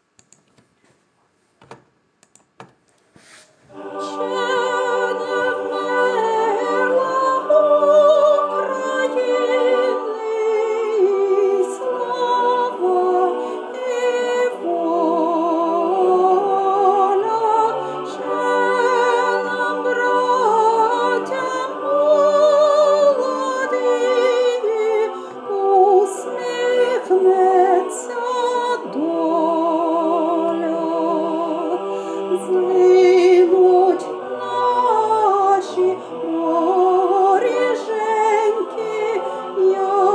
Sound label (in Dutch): hymne oekraine soprano; volkslied oekraine